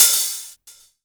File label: hi hat open, Korg Electribe ER-1; Kit 15